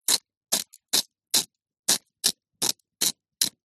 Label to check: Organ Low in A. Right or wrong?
Wrong